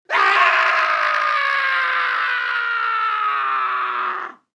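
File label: Male Long Fall Scream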